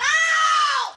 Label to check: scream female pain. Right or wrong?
Right